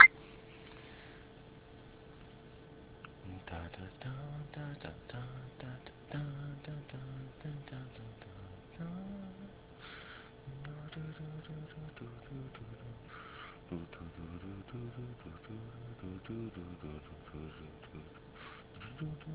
Voice Only falsetto

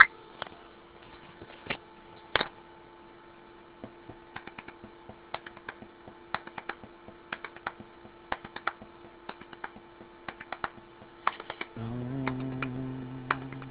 Idea Rhythm

